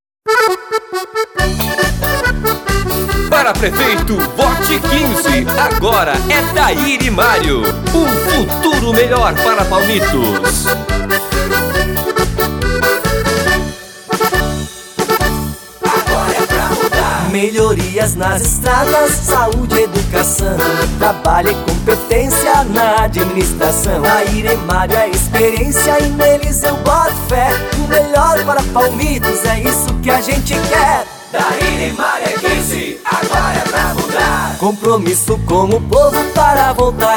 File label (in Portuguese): jingle parodia; Quel Mazzolin; Jingle Politico 2020